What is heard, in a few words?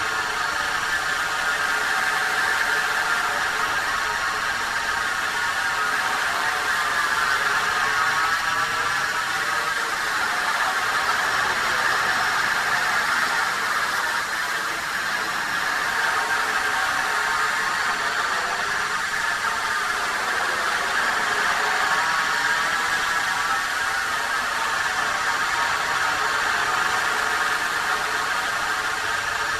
Drone Hover Close; Titanfall 2